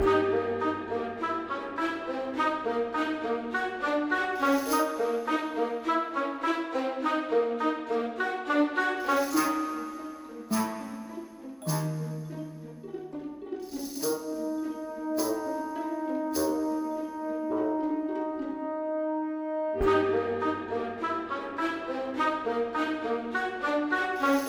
Loop Am 103 Elevator; Disney Infinity

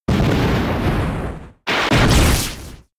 Thunder

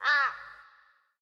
Vulture; Puzzle Chronicles; PS3; PSP; X360

